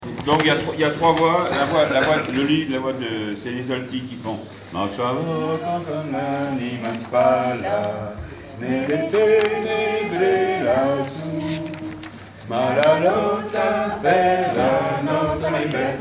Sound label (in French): 3 voix